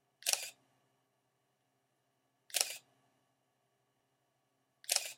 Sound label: 400D Singleshot